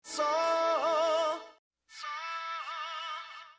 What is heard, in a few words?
vox; Hip Hop /R&B instrumental